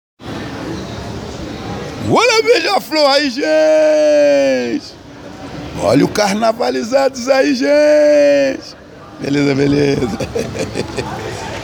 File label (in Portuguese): grito